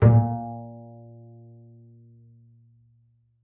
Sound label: PIBF A#2 -L, BASS PIZZ; Xsample VOL-5